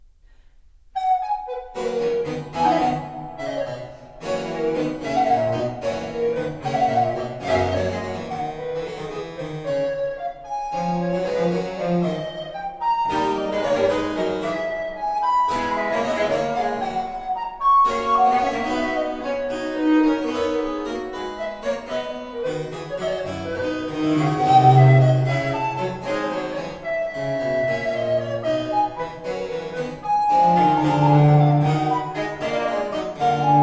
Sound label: Sonata II In C Major Presto, Recorder and Basso Continuo; Francesco Barsanti; Sonatas for Recorder and Basso Continuo